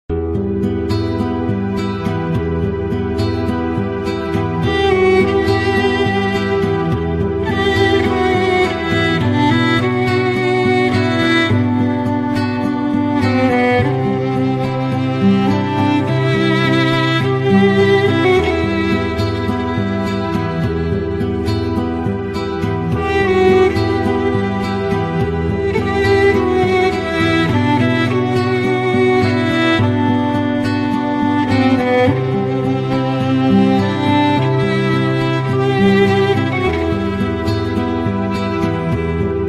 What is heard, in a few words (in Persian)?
saz gham